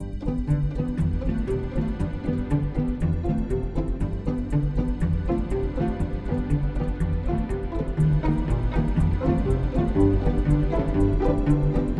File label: Quirky Ambient